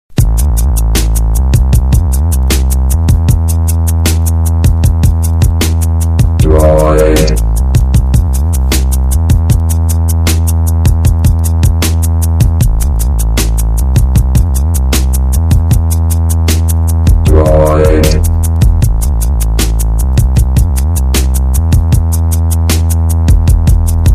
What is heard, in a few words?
Droid Hip Hop